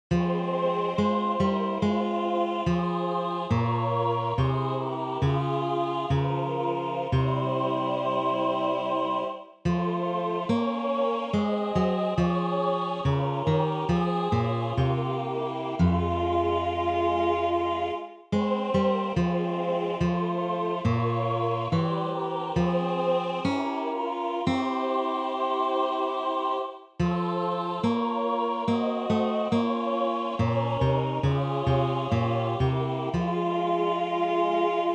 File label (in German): Aus tiefer Not chor 2 basse